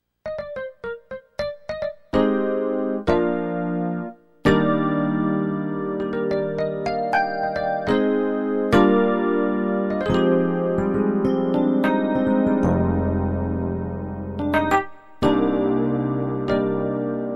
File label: EPiano